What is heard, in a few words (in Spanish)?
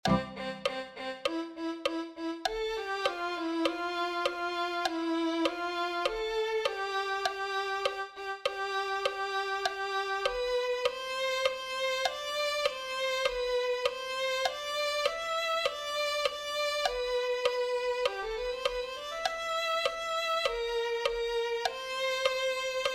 a cristo coronad violin